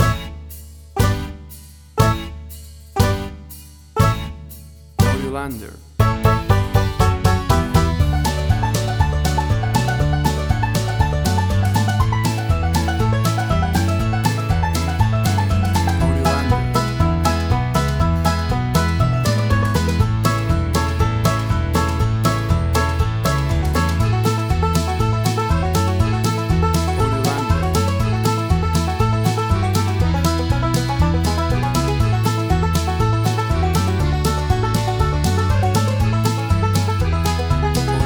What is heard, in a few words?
Bouncy Fun Country Music